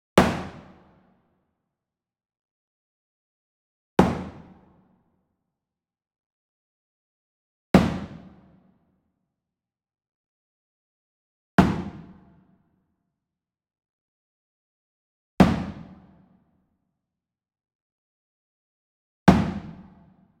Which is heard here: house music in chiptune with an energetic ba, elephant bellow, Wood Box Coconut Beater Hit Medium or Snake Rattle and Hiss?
Wood Box Coconut Beater Hit Medium